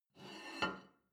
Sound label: plate take table slide, gastronomie; soundpool